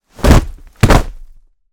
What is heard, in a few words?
Body fall cement; foley walkers